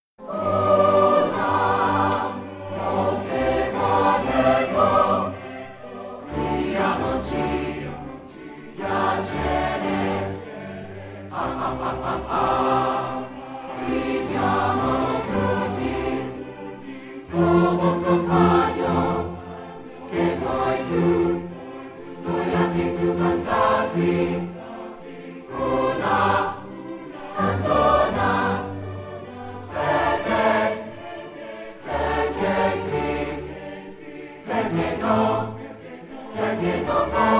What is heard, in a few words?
Echo Song; “O la, o che bon eccho! (The Echo Song)” – Orlando di Lasso; Brookline High School Camerata